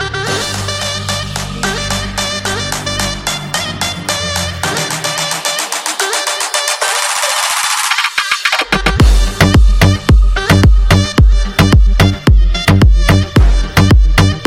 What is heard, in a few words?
Shenai Tik Tok Ringtone; Bollywood